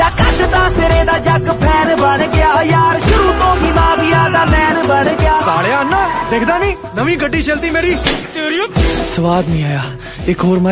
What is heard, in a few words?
Punjabi Movie Song Ringtone; Shooter